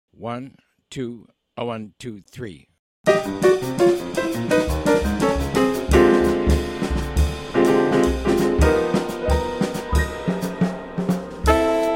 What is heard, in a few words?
Jolly Minor minus bass